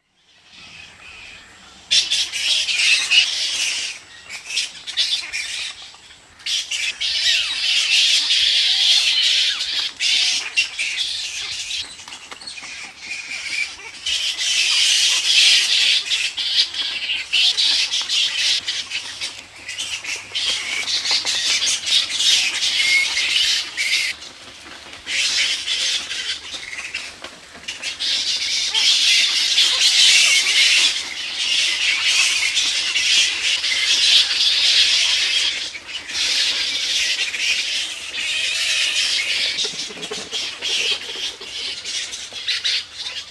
Fruit bats and painted storks, Ranganathittu Bird Sanctuary, Tamil Nadu; Outdoor; BBC